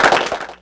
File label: Clapbox Pitch; Ableton Foundation FreePacks